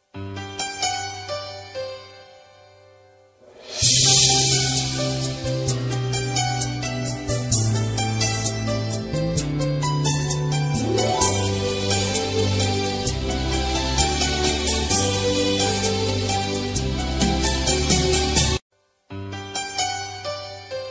mungarumale bgm ringtone kannada trance ringtone

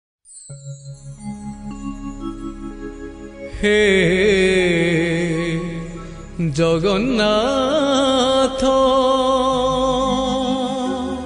odia bhajan ringtone; jagannath

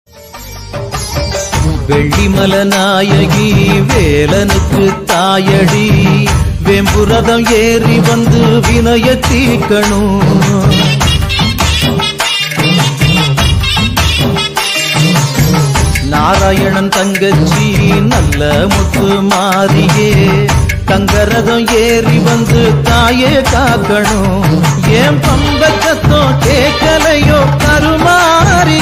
amman ringtone tamil song

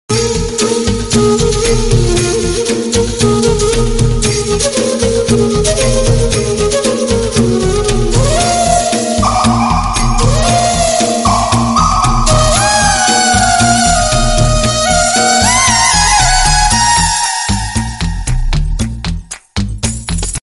song flute; chiyan vikram

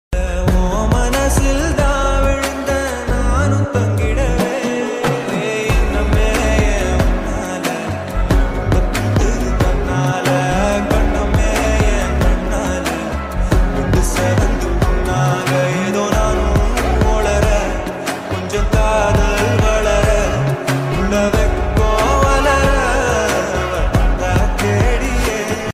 tamil love story; premalu

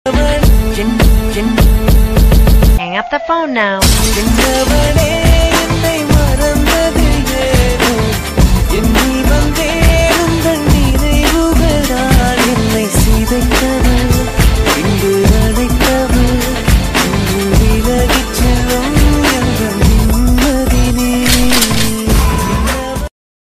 remix full song tamil

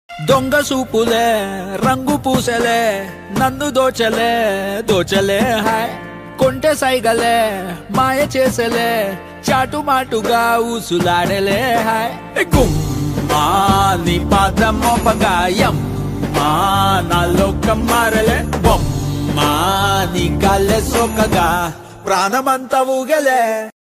song ringtone

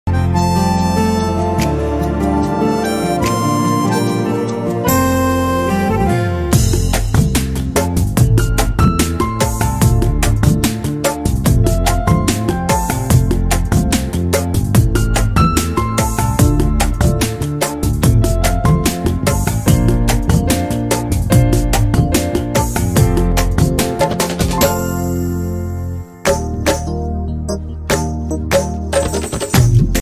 new Odia ringtone, Odia song

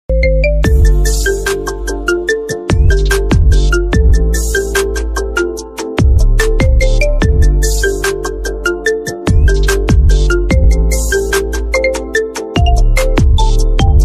bgm ringtone, love ringtone

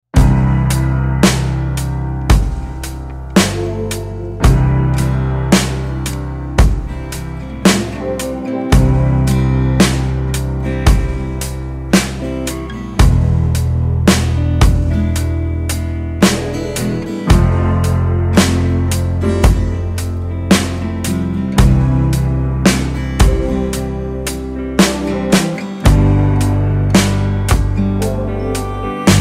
navaratri bhakti ringtone; mata rani ringtone; durga mata bhakti ringtone

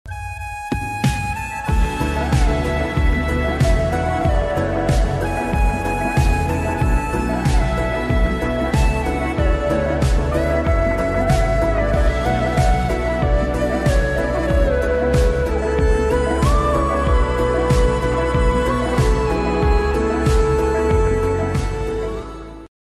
flute bgm; bhediya

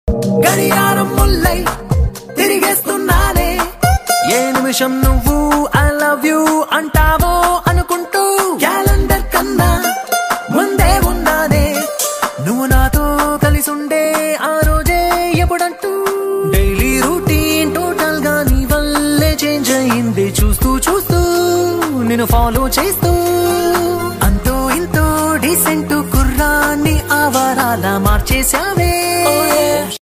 Telugu love songs; dookudu; mahesh babu

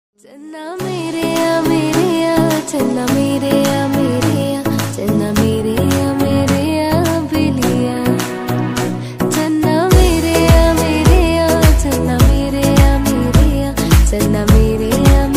female

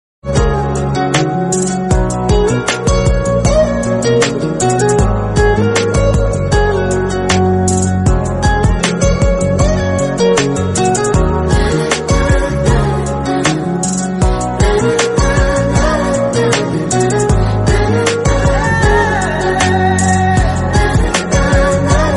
bgm telugu instrumental ringtone

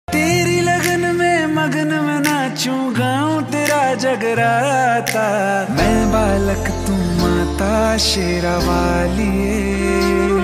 bhakti ringtone

